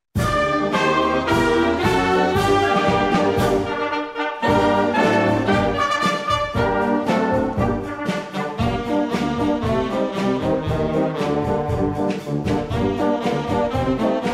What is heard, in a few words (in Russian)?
музыка